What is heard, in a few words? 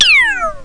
Energy Appears; Asterax